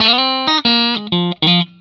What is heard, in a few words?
RIFF 120, Vintage Blues Guitar